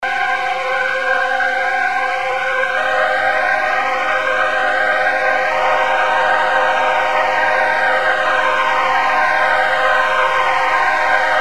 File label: Atmo